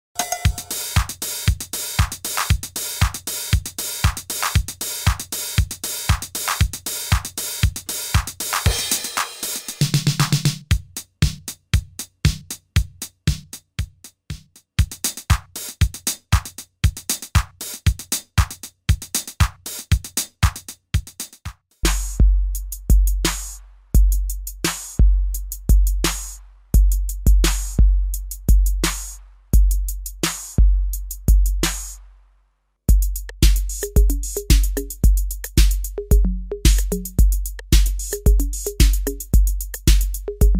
Vintage Drum Machines; Touch Loops

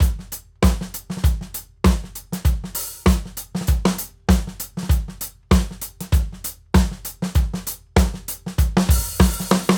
Drums; Infinite Drums

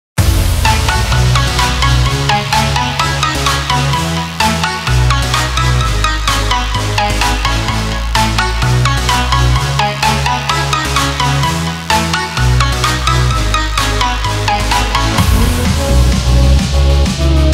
EDM; EDM 2018 Sample Tools by Cr2 Sample Pack

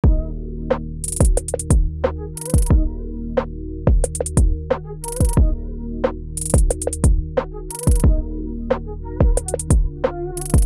Trap; Immortal Trap Vol. 1

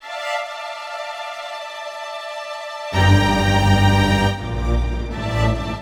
String Tremolo Strings

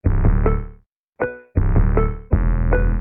Garbled Disfunction

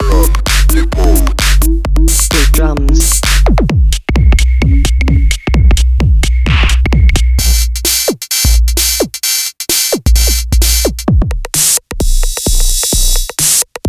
Brute Drums; Drum Depot

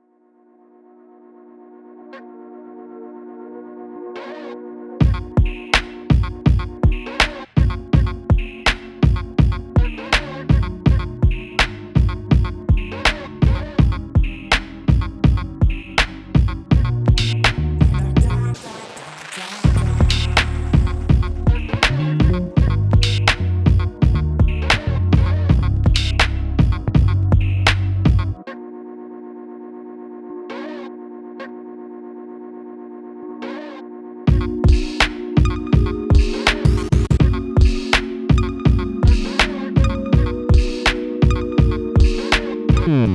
Drums and Chops; MSXII

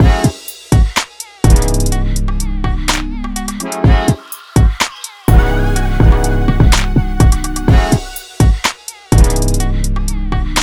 LoFi Soul and Future Beats

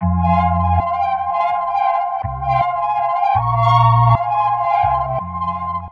Organ; Ghost in my Organ

